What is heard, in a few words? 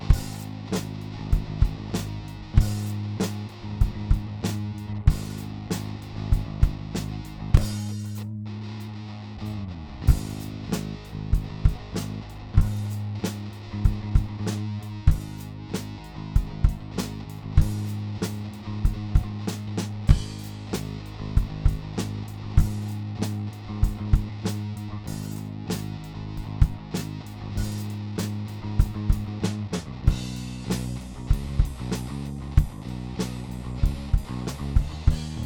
BASS, GTR, DRUM; 97 Percent Fire Studio